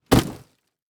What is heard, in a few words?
Impact Treasure Chest Drop On Dirt; Impacts